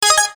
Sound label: Ding